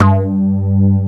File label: inner wood; Greytsounds; Scott Peers Sound Engineering